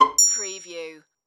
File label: Pizzicato String Glock